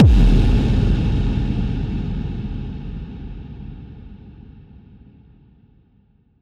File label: Riddim Impact, Collapse; One Shots; Ghosthack; Riddim Essentials 2020